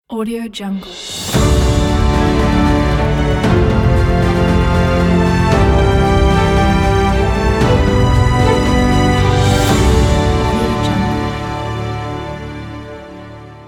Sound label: epic strong logo